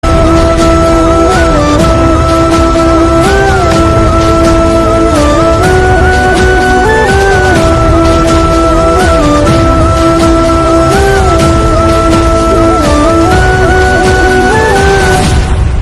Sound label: Flute Love Bgm; KGF